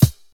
rich highs bass drum C key

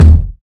good kick d sharp key; kick drum samples; one shots

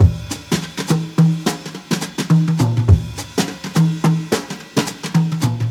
83 bpm jazz drum loop f key

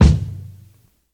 thumpy kick drum sound G key

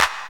clean clap D key; single hits one shots

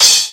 tamed open hi hat sample g key; open hat samples

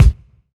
kick drum sound C key

